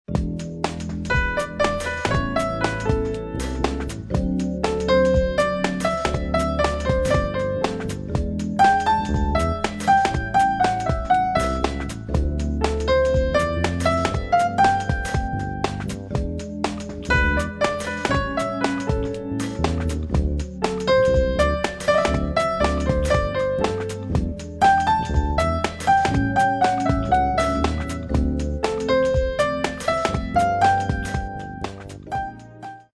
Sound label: light jazz